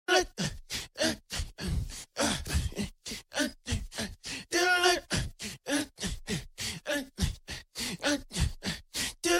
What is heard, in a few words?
vocal loops